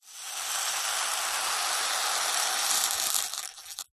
leisure toy rain stick ball bearings spiral fall in plastic tube; zapsplat